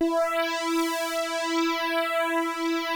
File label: KORG, Rare Synthesizer